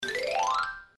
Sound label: Xylophone Jingle